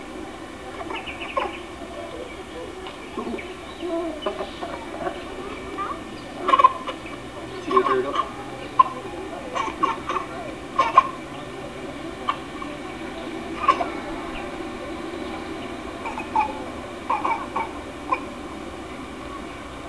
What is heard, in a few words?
coughing happy